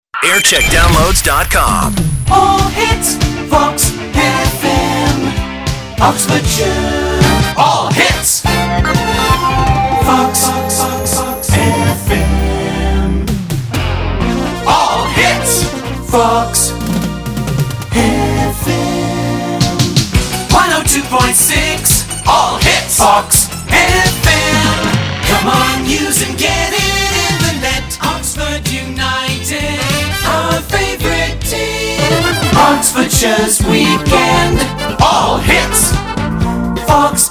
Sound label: jingle montage; fox fm